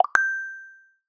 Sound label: eureka